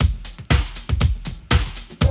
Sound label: BREAK